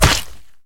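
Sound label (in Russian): нокаутирующий удар противника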